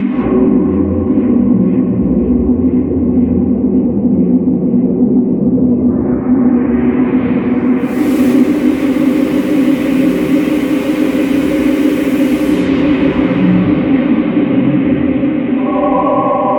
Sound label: Atmosphere Unterwelt Bm 124